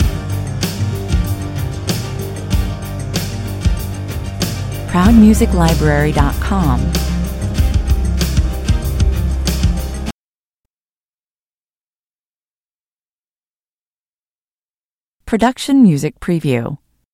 dance rhythm level loop

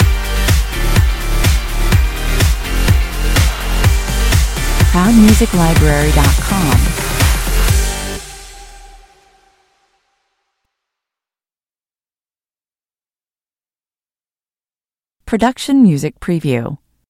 stinger

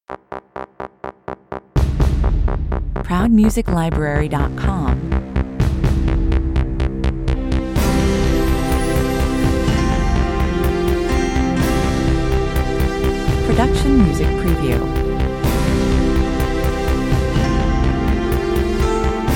winner hymn 125 bpm

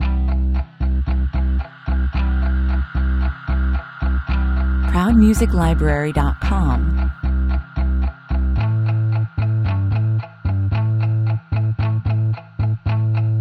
acoustic, tension, 112 bpm

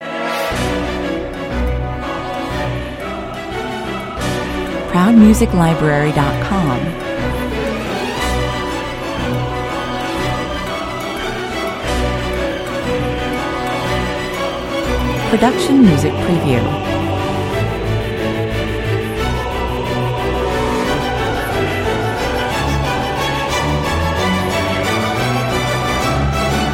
no percussion

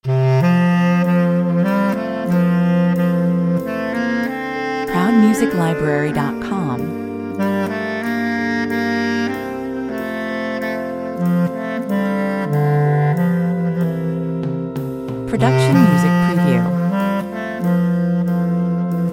away in a manger (clarinet)

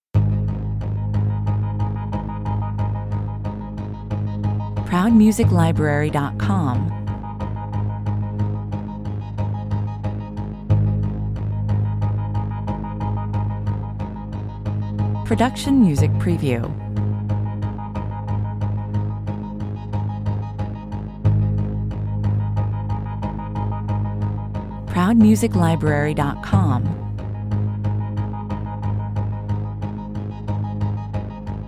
proceed with caution (no lead drums perc)

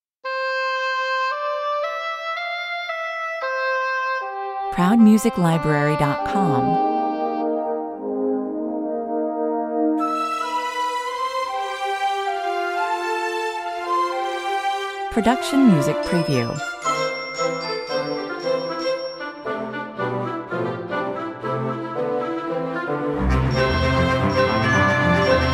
commercial

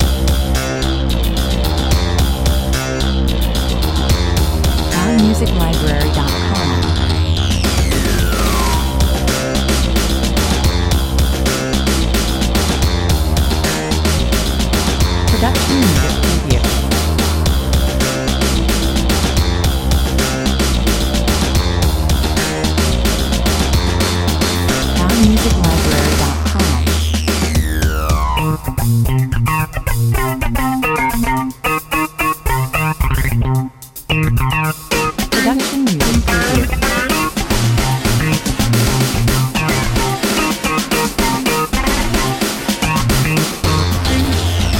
big buzz 110 bpm

